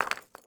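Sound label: ui drop charcoal; Rust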